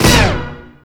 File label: HIT, 160 BPM, Drum & Bass; Ueberschall; Drum & Bass Flash Trackz